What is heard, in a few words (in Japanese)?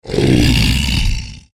クリーチャーボイス